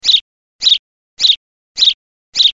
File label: House Sparrow